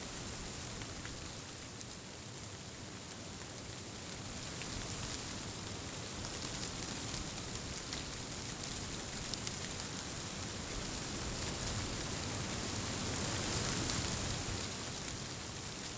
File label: wind; Golden Crayon Sounds